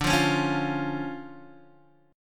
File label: D Minor Major 7th D Guitar DADGAD